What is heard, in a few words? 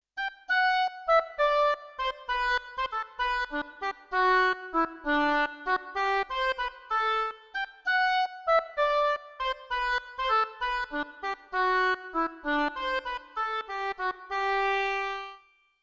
Woodwinds Section, EWI5000